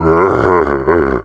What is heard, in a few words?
Scrake Infected; zombie plague